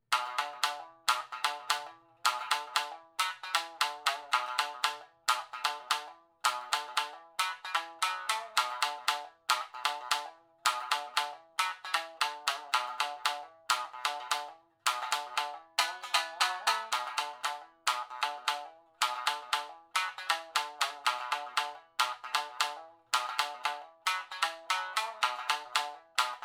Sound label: Backbeat, shamisen